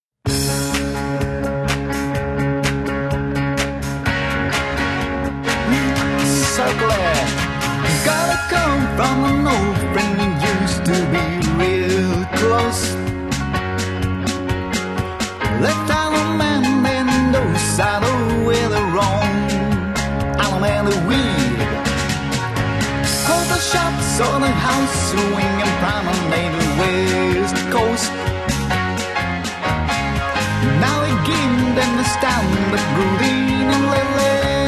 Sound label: my life vocal